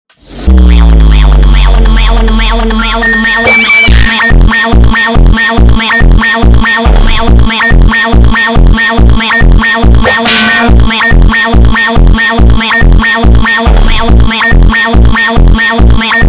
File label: Funk sped Up